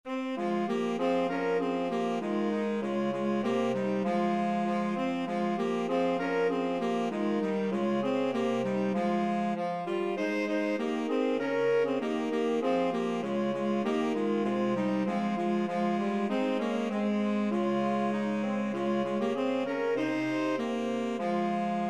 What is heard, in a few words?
flexible mixed ensemble 3 players